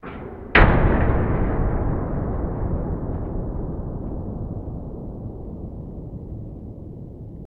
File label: Impact Low Boom Loop